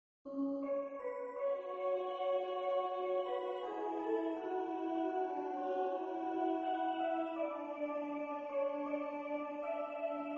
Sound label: alto solo